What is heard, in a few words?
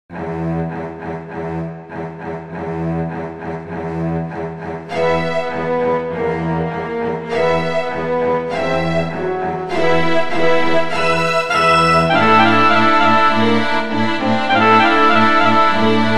Phrygian